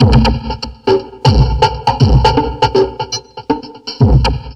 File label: Drum Loops Crazy Processed